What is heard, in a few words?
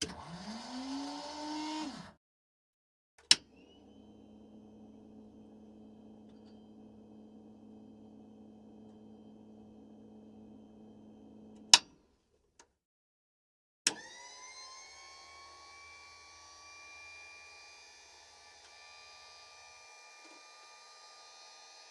p 51d foley buttons switches canopy dashboard; sonniss gdc